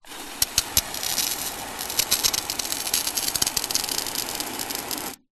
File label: industrial pressure washer spray power off nozzle end; zapsplat